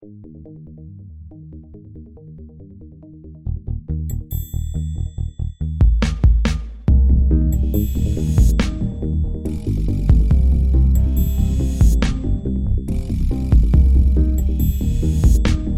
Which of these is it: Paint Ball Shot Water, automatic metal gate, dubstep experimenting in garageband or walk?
dubstep experimenting in garageband